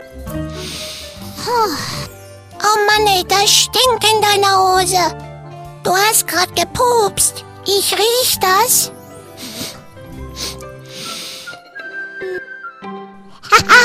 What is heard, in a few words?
Fart